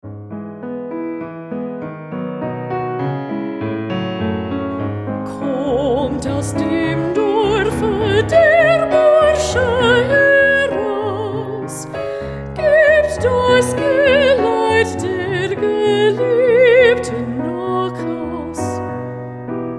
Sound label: soprano 2